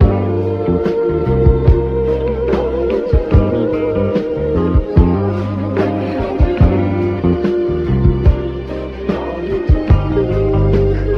full, Loops Phonky

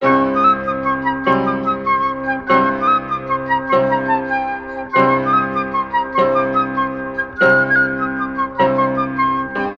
flute irish jib, Loops Phonky

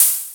Hats Electro Open

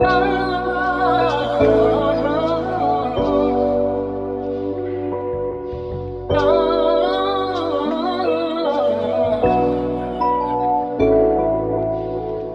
voice and keys rnb singer; Sad; Loops

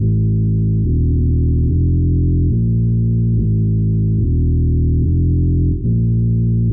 bass wooden pendulem, Loops, Sad

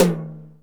Toms, Real

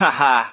"ha ha", Voice

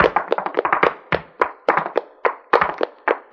claps and stomps, Drum loops

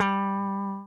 Guitar; Notes

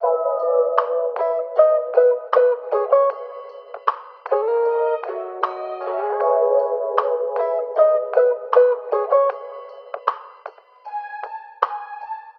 guitar, Phonky Loops

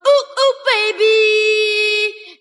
ooh ooh baby SUNG, Voice; ooh ahh

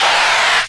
crash fake; Crash Bang Boom

